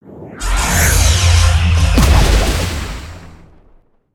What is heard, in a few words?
Spin; Skullken Inventor; creatures organic